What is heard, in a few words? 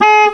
Honk; 暴力赛车